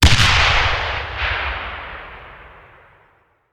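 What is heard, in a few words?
Artillery Grenade Launcher; Sound Ideas; Guns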